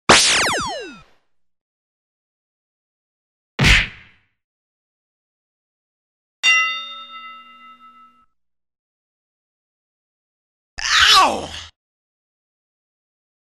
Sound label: Scratch Samples